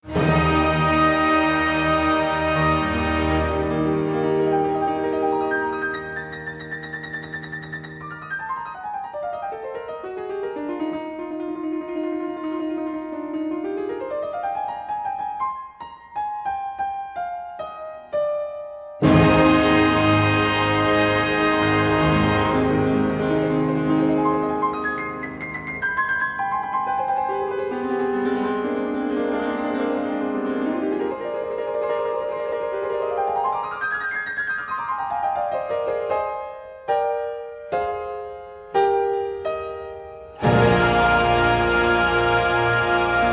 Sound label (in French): concerto piano orchestre philharmonique; concerto lempereur; beethoven; francois frederic guy; radiofrance